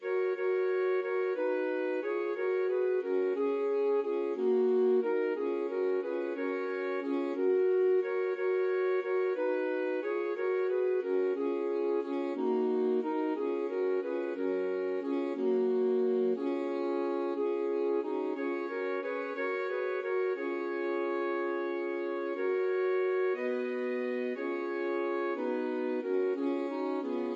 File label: sussex carol A SAX quartet